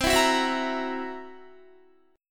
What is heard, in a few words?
C 7th Flat 5th C down Guitar; C7b5 strummed